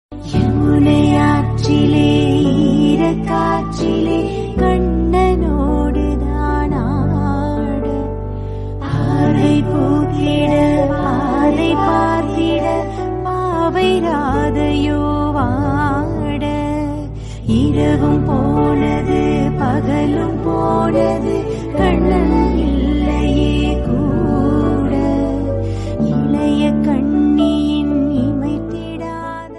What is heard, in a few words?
tamil devotional lord krishna